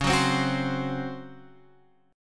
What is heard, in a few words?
C sharp Major 7th Suspended 4th